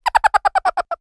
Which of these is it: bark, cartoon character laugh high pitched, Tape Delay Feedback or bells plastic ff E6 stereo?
cartoon character laugh high pitched